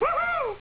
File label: "woohoo"